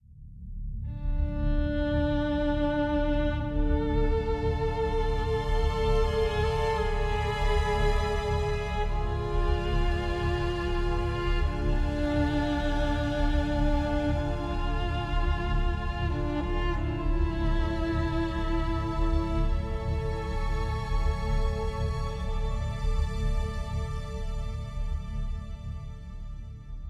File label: Approaching Target; assassins creed ii